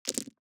DICE on Felt, Throw and Roll, Standard, Sixteen Dice; Samples; ArticulatedSounds; DICE Sfx Party (Extended)